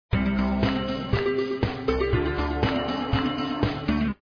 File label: Big Ride; hifi; jonny ho